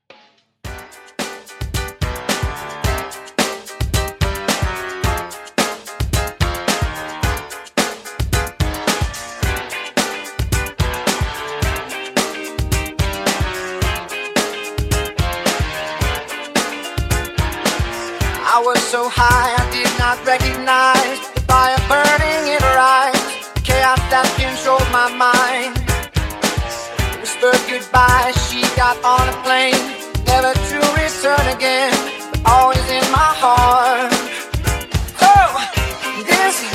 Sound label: Alternative Rock DownTempo, 82 BPM